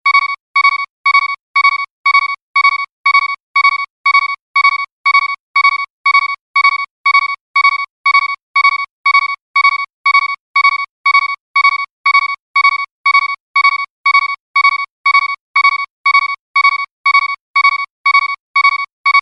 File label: Low Health; Lttp